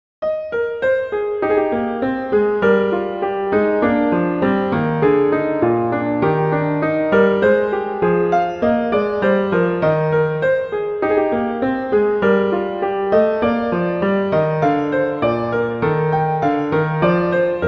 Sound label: Gavotte; Bach; French Suites; Suite IV